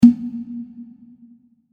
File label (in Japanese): 卒業証書入れ ポンッ 響きあり